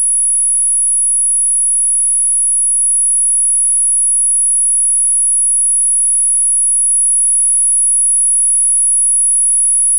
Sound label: 10KHZ